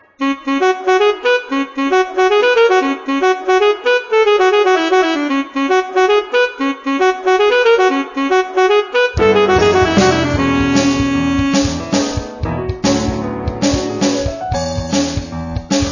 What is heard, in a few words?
for sax pf dr